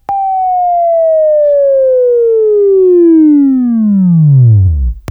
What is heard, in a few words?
Buzz, Left; Sweeping Training